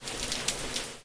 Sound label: rain; tuxpaint; magic